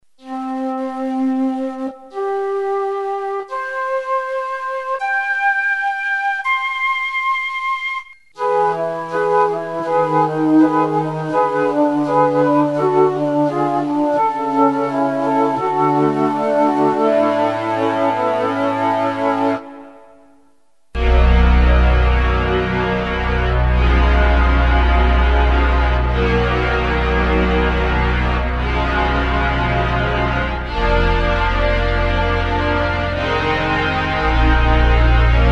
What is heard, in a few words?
mellotron